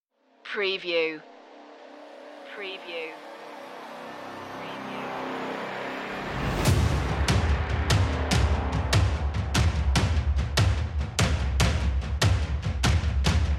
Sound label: drums sound fx only